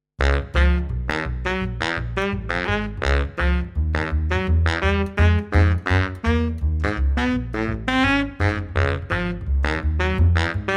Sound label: Bari Sax; samplemodeling